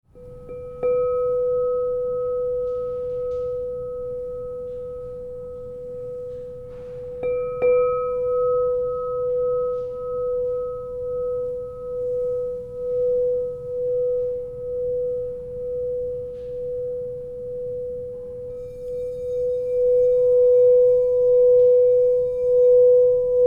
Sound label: Crystal Tones Singing Bowls; divinesound